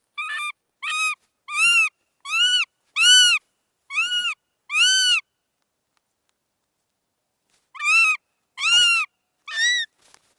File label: northern goshawk call squawk shriek screech long wings flapping movements; lucjan; sanken; Sonniss GDC